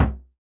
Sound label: Roland Juno D Perc Menu Kit